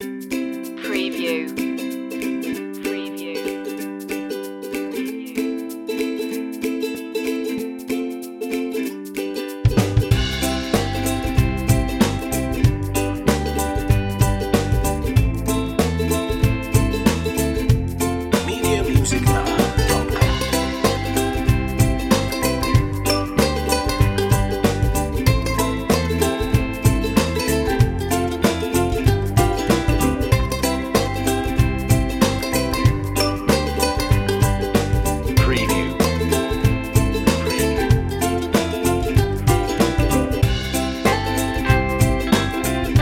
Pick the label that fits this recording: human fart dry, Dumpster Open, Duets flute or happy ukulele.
happy ukulele